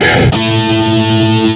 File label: guitar riff